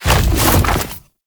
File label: Infested Splitter Jump Launch; Warframe; Grey Strain